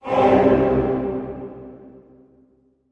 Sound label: Music Effect Choir, choirs